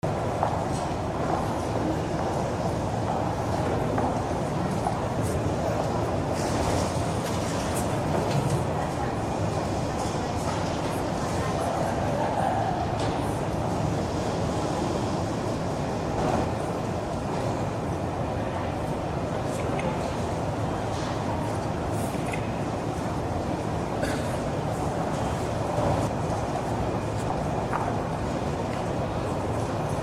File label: atmo airport large echoing terminal